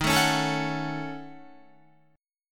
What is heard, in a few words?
Eb Minor 7th Flat 5th, Guitar; Ebm7b5; strummed; JGuitar